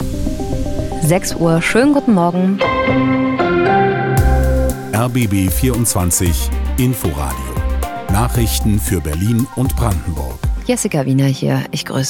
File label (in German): Blau Nachrichten Lang; swr3